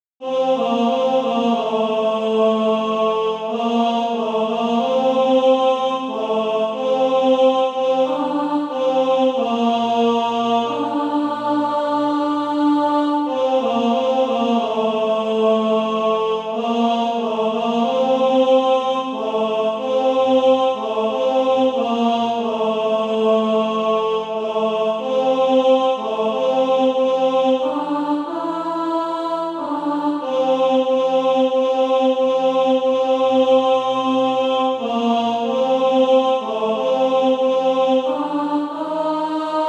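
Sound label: tenor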